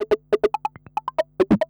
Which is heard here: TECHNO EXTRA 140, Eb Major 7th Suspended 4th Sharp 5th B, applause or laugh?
TECHNO EXTRA 140